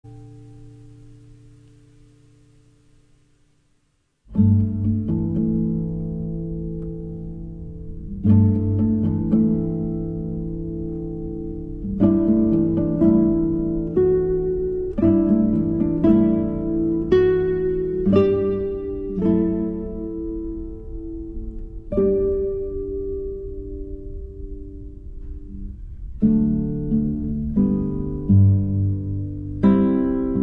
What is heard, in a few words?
Guitar Quartet